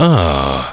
Ahh; Duke Nukem 3D Kill-A-Ton Collection

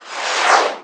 SYNTH; tenpin; PC Gamer 44

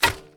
shoot arrow; Cupids Match Up

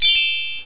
BELLS

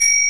frog; skunny

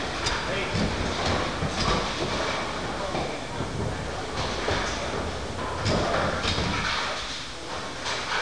Ambient; Funhouse of Love

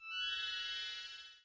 Sparkle; Thinkin' Science; EdMark

